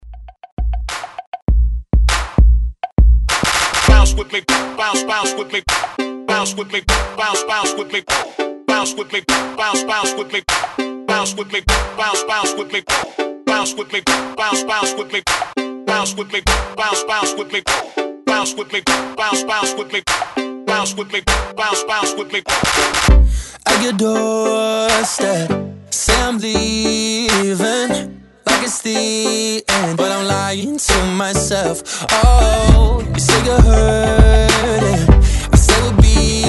Hype Redrum

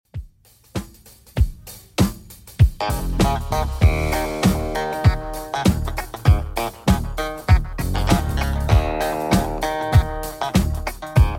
70s Redrum 98bpm

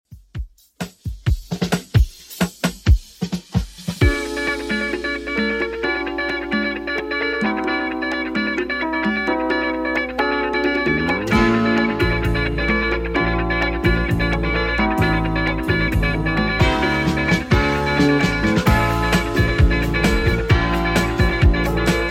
70s Redrum 131bpm

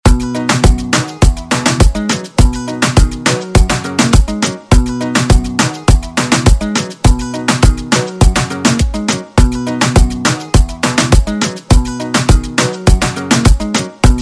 Moombah Afro Redrum

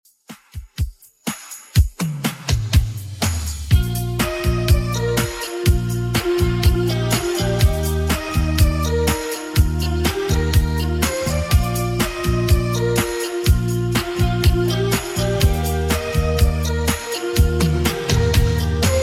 80s Redrum, 123bpm

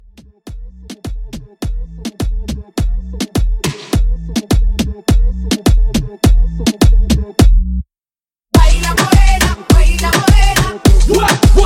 Moombah Hype 104

